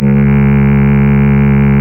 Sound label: REED C#; Big Fish Audio; Synclav FM